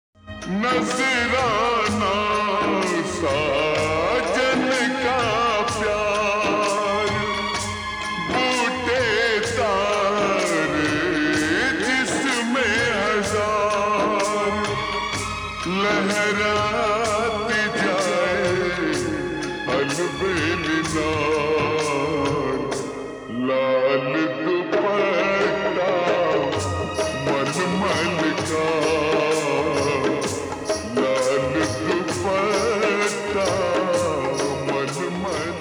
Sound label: Ringtone slowed and reverb